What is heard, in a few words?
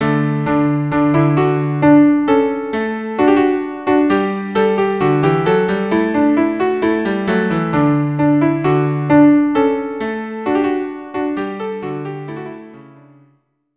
viola duo; Te Deum